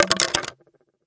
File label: Wood Drop; ModeAudio Found Percussion Hits